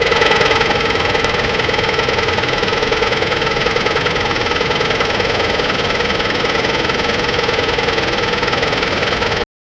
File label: tractor sound; agriculture